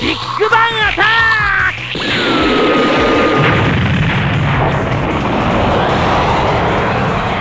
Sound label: bigbang